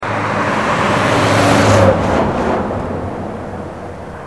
Camion

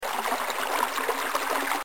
Riviere

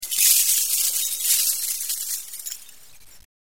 Verre

